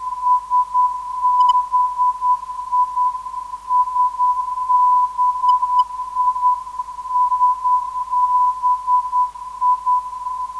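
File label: non-directional beacon; SBI; 332.89kHz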